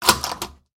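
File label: long switch lever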